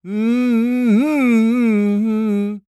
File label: SING, Blues Soul